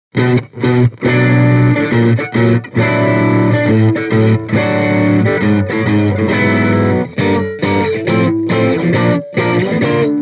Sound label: Celestion G12T 75 Crunch Stratocaster